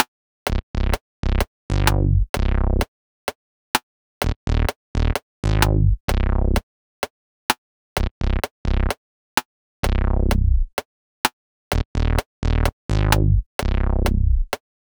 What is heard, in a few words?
bass